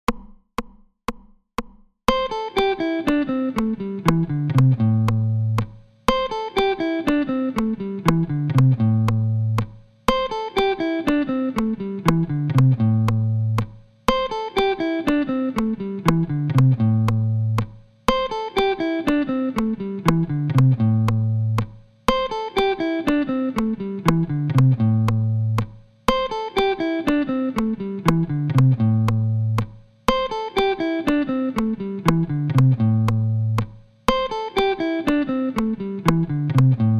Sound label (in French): Vers le grave; objectif solo 15 jours pour apprendre a improviser